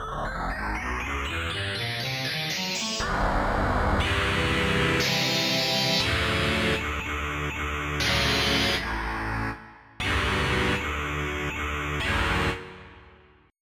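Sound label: Octave Feed Fade, fuzz guitars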